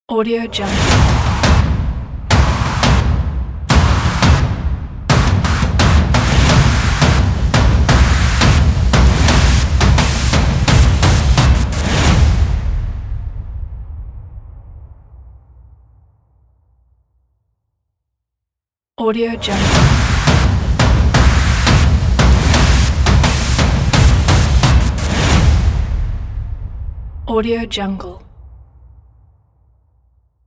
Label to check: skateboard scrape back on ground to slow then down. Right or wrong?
Wrong